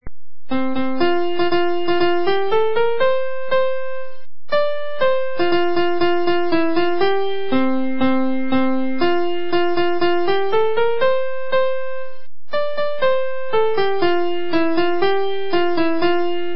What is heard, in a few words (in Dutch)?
liedje piano